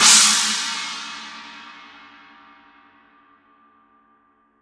Formula 602 Modern Essentials China Paiste

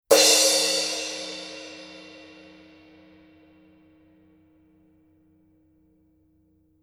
Color Sound 900 Red Crash Paiste

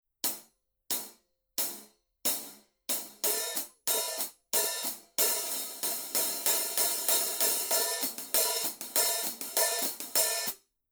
Color Sound 900 Black Hi Hat Paiste

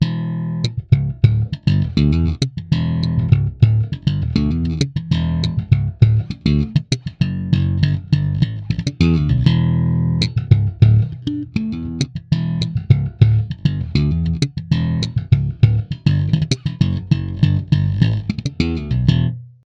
Yamaha TRB1005J NA

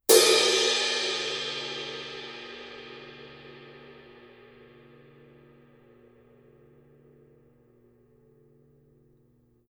Color Sound 900 Black Ride, Paiste

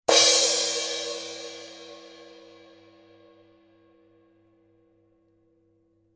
Color Sound 900 Red Heavy Crash Paiste

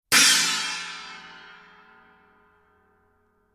Color Sound 900 Red China Paiste

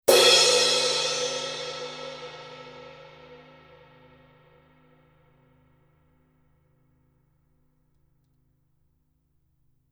Color Sound 900 Black Heavy Crash Paiste

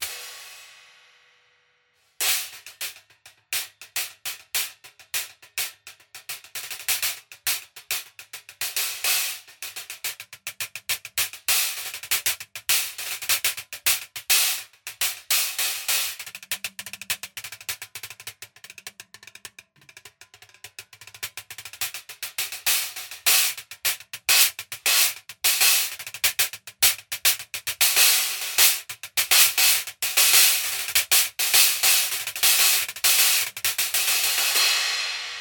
Paiste 14 PSTX Swiss Flanger Stack

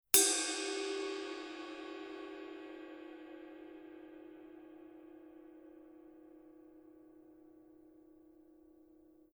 Color Sound 900 Red Ride, Paiste

